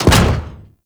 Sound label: Arachnoid Camper Step Default; Warframe; Corpus